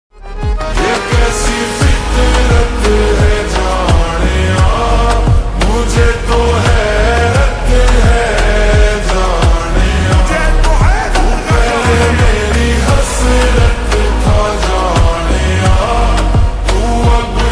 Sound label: Slow Reverb Version Ringtone